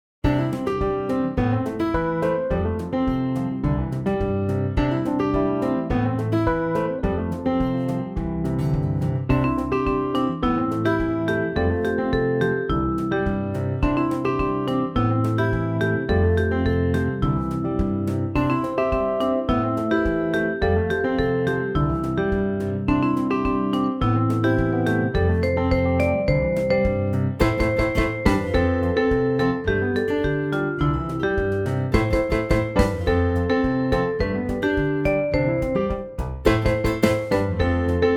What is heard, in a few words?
Rhythm Vocaline; Les 7 Oefening 24